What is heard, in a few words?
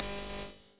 alert